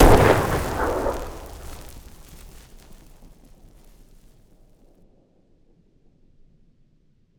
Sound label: Explosion Gas; meteorgame